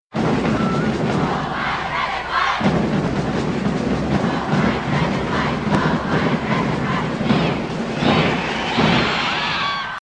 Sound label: Basketball